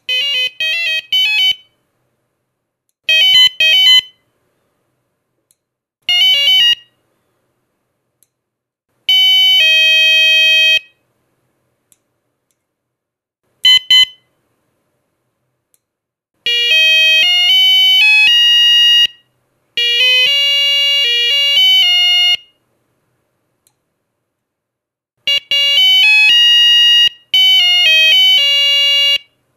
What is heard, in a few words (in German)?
klingeltoene innensirene, smoke alarm; System 8000